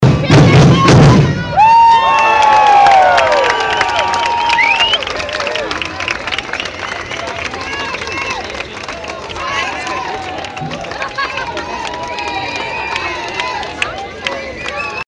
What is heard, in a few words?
bateria; bateria altona